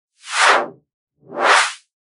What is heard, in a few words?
Whoosh Pair